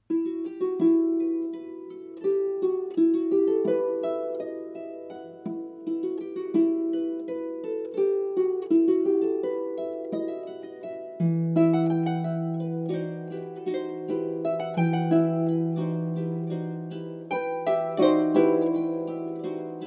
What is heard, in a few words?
Tambourin